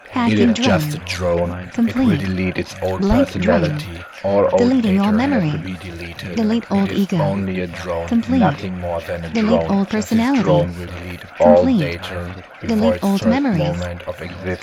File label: Drone loop medium; drone training